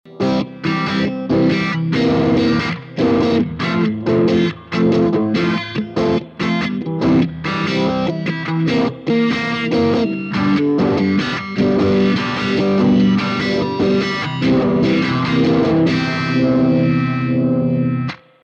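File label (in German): gitarre classic blues; boss gigcaster 5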